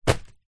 foley flowers fake plastic set down carpet

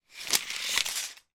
industrial tool tape measure retract short length

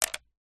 foley pebble very small drop onto others in pot; zapsplat

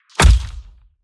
Impacts processed; soundbits

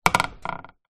household furniture old wooden creak

